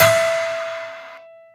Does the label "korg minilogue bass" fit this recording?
No